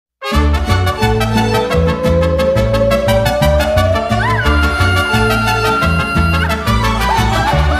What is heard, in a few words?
mariachi; warmusic